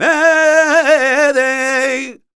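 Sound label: Flamenco, male cantaor, vocal; Best Service ProSamples vol.46; AKAI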